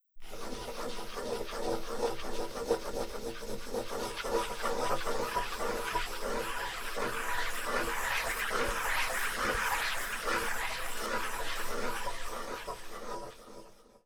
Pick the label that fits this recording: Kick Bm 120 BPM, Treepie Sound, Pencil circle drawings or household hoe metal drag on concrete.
Pencil circle drawings